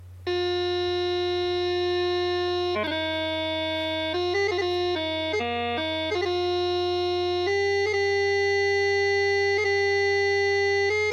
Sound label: Chanter Snare; North Berwick Pipe Band